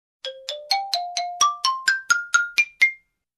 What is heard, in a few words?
Ascending xylophone melody